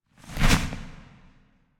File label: landing; smite; khepri